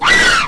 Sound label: elephant; HyperCard In Education; MapStack